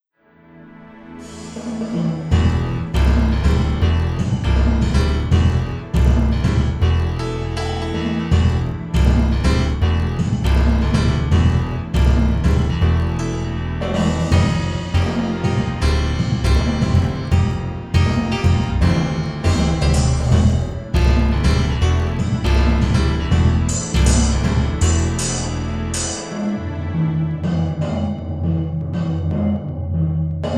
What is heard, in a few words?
trash room metal grate hybrid; tascam